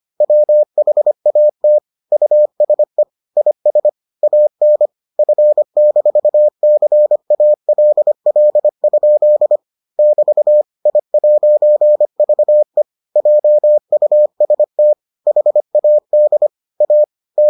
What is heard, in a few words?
morse